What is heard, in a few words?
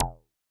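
jungle bass; Yamaha DTXpress-II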